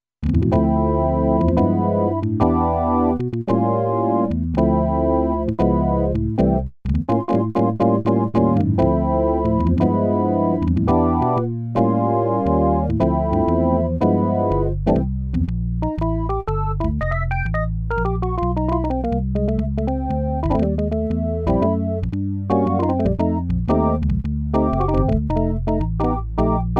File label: Hammond Orgn